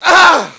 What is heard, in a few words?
bump; quake2